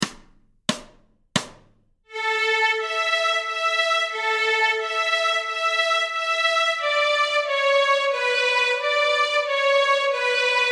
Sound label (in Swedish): Stråk A, Nyckelharpa; Adams vals; A-strängen Steg 1 Två toner i dur